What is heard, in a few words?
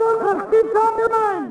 walla; Net Power 2003 March; Camelot